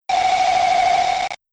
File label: Laser Interference, sci-fi laser sound effect; Andrew Fleming